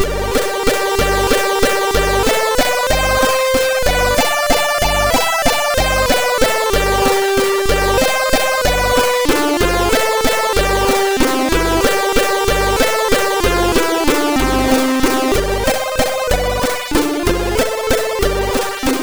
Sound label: sid, MOS 6581; MOS6581R4; Crystaliczna Owca 1